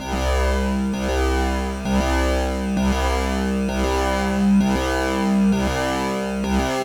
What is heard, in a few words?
SYNTH, C2; WARLOCK; AMG; Global Trance Mission VOL-2